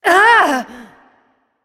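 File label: grunt hit, female; smite; voicepack; hera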